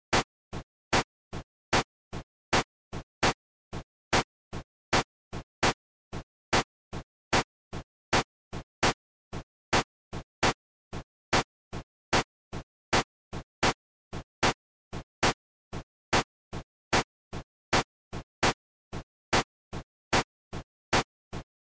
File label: Synch ahead, rhythm; music